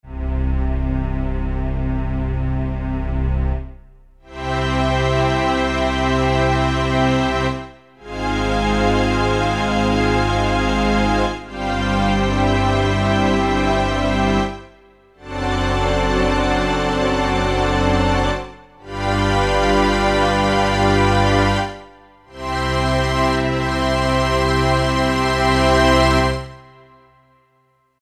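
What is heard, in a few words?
ensemble Mellotron; E-mu Proteus FX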